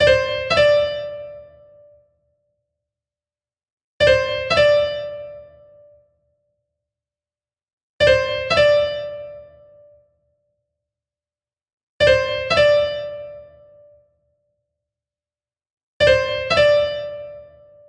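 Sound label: short appoggiatura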